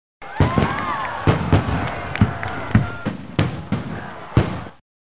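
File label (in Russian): толпа и новогодние фейерверки; zvuki novogo goda; tolpa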